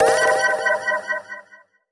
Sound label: start